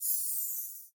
sparkle dash

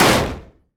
hit mechanical hard; CrossCode; battle

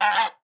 pet goose

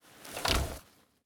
helmet

